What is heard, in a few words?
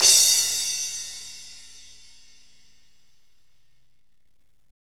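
cymbal techno, Roland; Northstar; Drumscapes Roland; Cymbals 2; Crash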